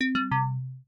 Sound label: Low Battery